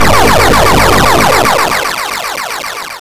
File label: win